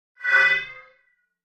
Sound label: Wash HF Elect Tone Fla